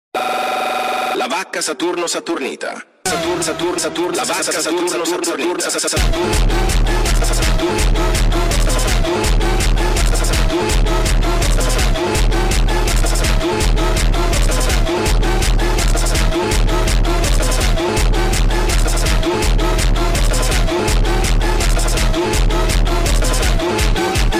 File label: Funk speed Up